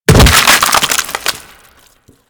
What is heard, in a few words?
Infested Jetpack Pod Explosion; Warframe